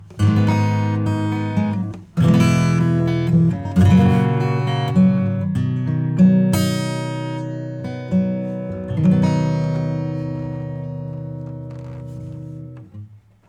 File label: acoustic; Kelvin